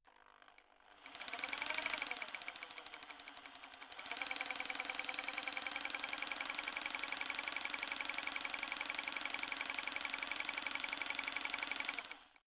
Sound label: rock drill chisel long settled run; maschinen; schrauben drehen hydraulik